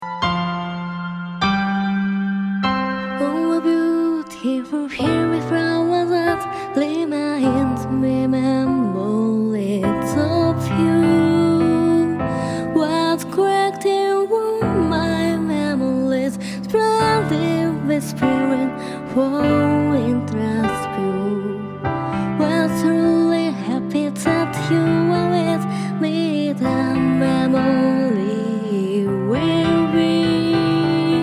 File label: music